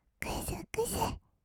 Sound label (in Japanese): くすくす, kawaii voice; 1200 kawaii voice!!; 色々なワンショット